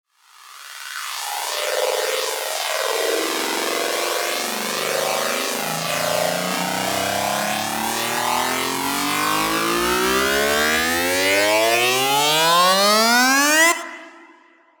Buildup, Dubstep

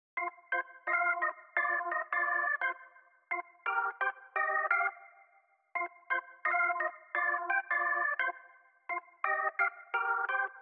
Classic Melody Loop - 86 BPM C Min Organ; Melodies; Loop Stems; Cymatics; Oracle Sample Pack

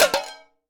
Foley (Can); Cymatics; GHOST (Beta Pack)

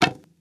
Bow Release, Game Weapons; Military; Game Essentials

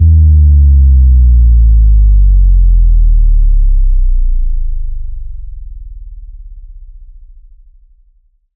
Downlifter Sub Drop; One-Shots; SFX; Ghosthack; Color Bass Essentials

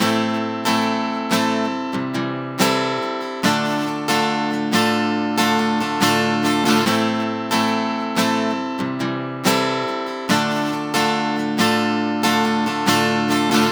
Guitar 140 BPM Amin, Guitar Loops; Weighted Shoulders 2; GhostHack; Ghosthack Advent Calendar 2021 - Day 10 - Guitar Loops and One-Shots

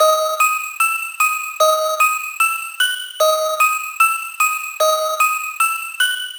Lead Loop D# Crusher, Dubstep, 150bpm; Ghosthack; Dubstep Sound Arsenal

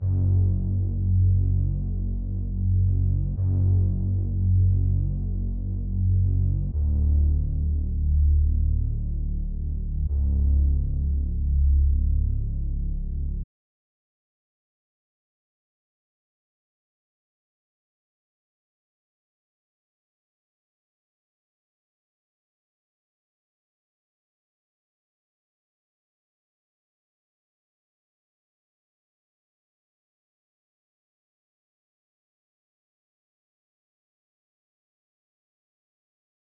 143 BPM F# Min Bass, melody loop stem; Trap; Loop Stems; Cymatics; 2022 Melody Collection; Streets

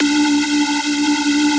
Techno Synth Shot D; Synth One-Shots; Ghosthack; 45 Free Techno Synth Shots 2025

